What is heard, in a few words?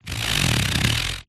sport plastic sports cone short scrape on concrete vibrate shake; zapsplat